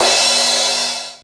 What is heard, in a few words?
LINN2 CRASH; Hollywood Edge; The Big Bad Beatmachine